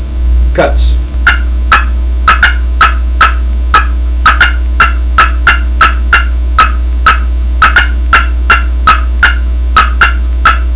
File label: copper cutts, turkey; Southland Sounds